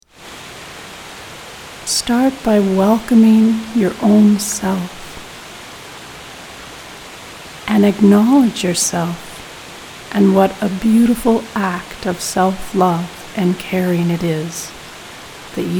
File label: Waterfall Refresh